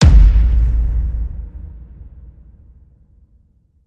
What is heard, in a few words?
Hit Big Bellow